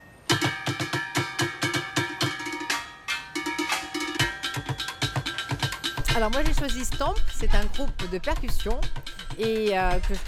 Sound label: Stomp